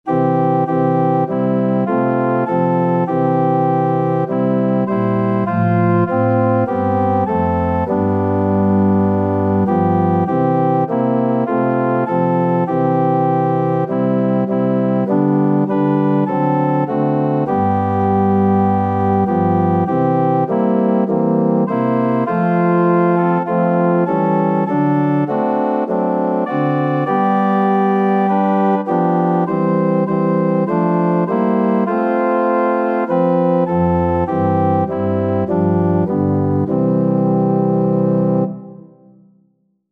lead on king organ

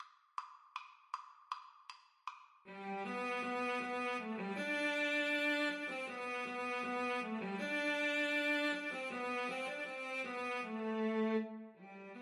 cello duet

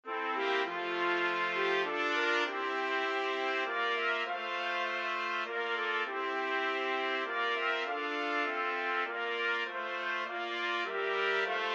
first noel, trumpet quartet

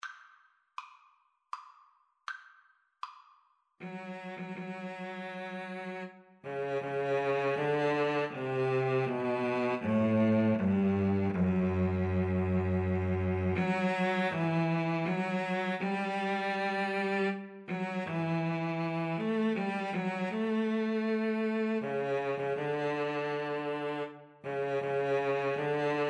nocturne, Viola Cello Duet

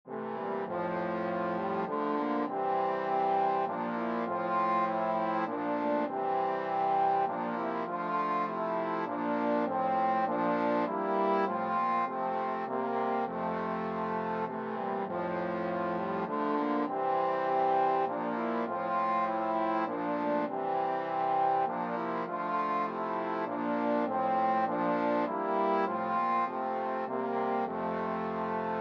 first noel, trombone quartet

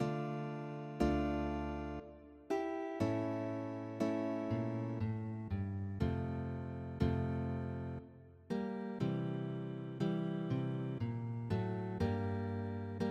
Sarabande GTR; Handel

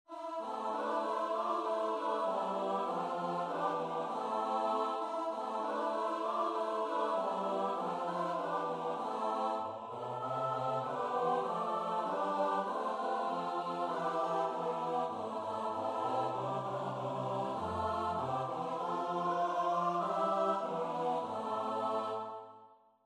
choir; huron carol

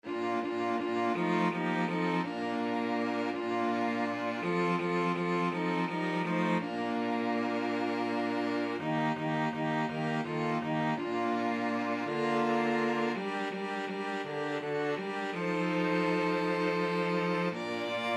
string quartet

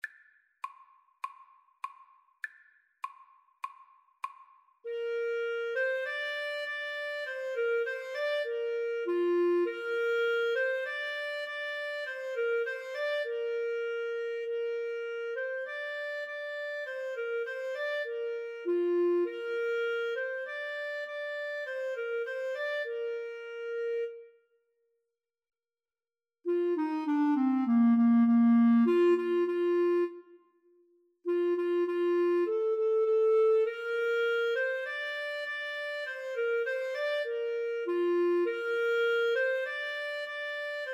Clarinet Duet

